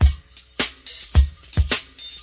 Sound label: 107 drum speak heel dragger; 101 to 110 bpm